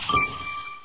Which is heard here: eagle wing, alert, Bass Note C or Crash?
alert